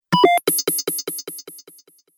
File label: Digital Data Sequence, Sci Fi Music